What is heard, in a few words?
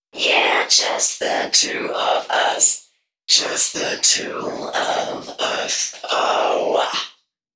female c#, Dance Ultra Voice FX; Mutekki Media